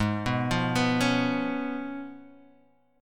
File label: C sharp 7th, G sharp